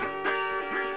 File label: Gmi, Harp